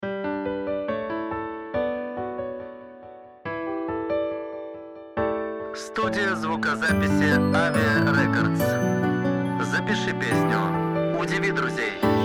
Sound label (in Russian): ПРОМО МИНУС